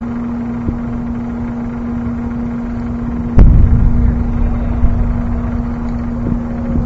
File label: sonic boom; sts116; shuttle entry